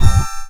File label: Vinyl Fx